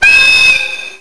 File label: Whistle EF81